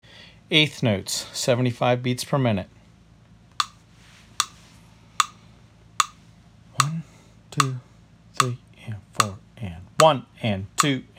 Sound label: Eighth Notes Counted 75bmp; Drum Lessons